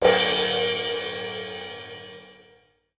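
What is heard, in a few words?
stereo crash, cymbal; East West; Global Sounds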